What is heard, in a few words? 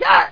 throw